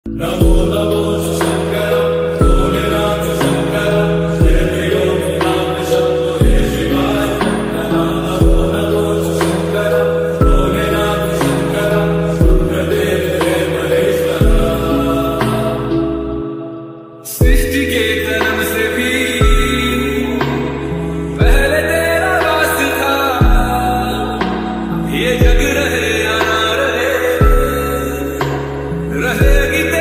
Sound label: song lofi